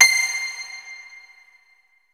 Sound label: HARP C5 L, SYNTH PLUCK; Music Store - Claudius Diemeter Schwerlos